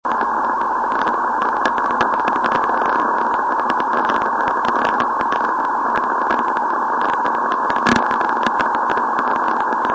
28.5khz msk

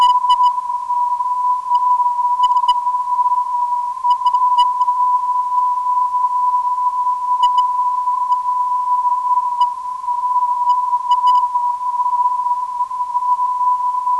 342.04kHz, ndb

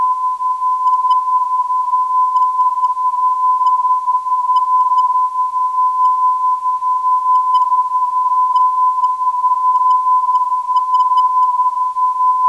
352kHz, ndb; BRY

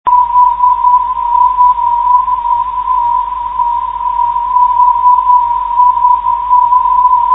376khz, ndb

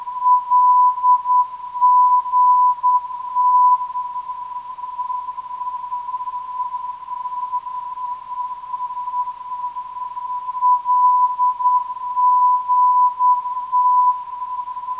LGT 500kHz

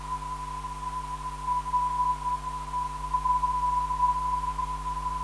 479.5kHz, ndb; RER

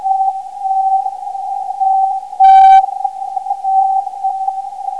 on 13kHz; M..M

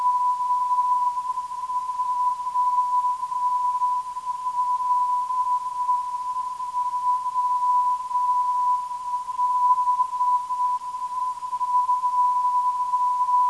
WD 324.09kHz, ndb

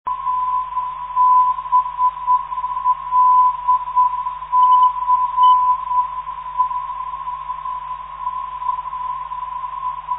blc 376 kHz, ndb

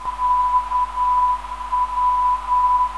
403kHz, NDB

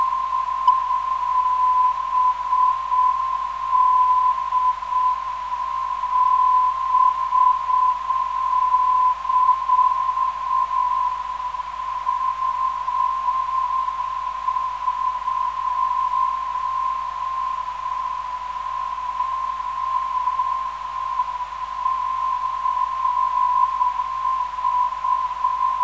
361kHz, ndb

